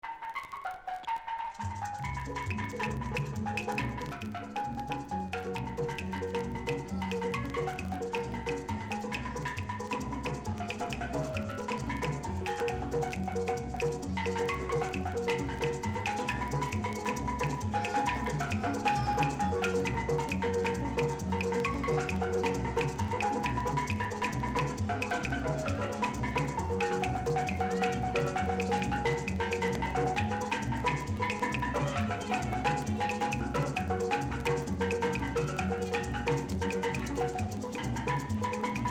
STEEL DRUM

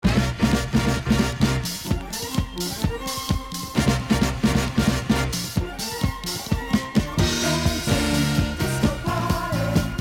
DISCO

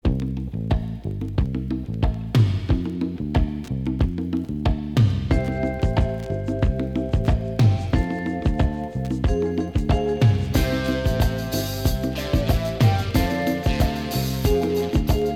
BRASS BAND

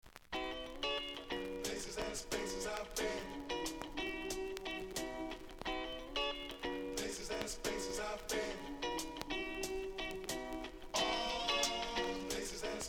INSTRUMENTAL

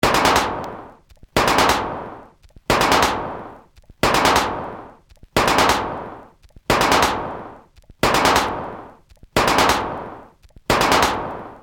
MACHINGAN LOOP

